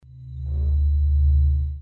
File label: sounds of the Sun NASA